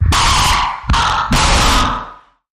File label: Extremely Harsh Static Blasts